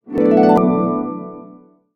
event success

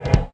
yeti hit; monsters

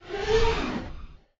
fallen die, monsters

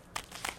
maggot miss, monsters

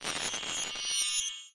generic, magic

